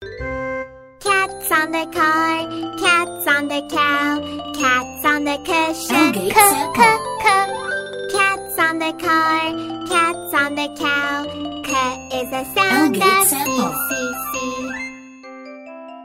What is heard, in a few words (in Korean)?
영어여 Song Girl